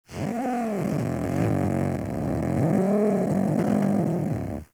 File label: Catbrow Feral Vocal Idle; Enemies; Warframe